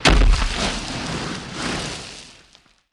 Body Falls On Dirt

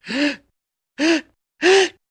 1 Inhale Followed By, vocals

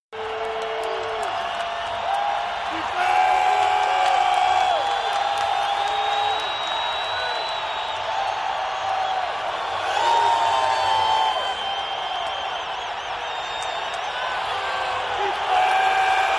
Football Crowd Defe, sports arena football

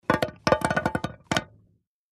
Pouring potatoes into an empty pot.

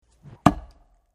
Ax Wood Single Chop Si, axe hits

